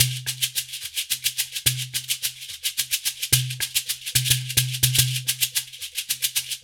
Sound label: Bembe 6/8, World Dance; Spectrasonics; Supreme Beats